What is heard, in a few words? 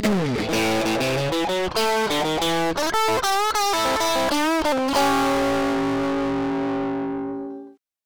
tubescreamer 500k; spice